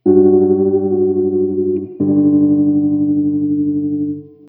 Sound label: Tuning; Hylics